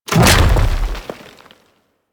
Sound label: Thumper Bot Stomp D; Warframe; Grineer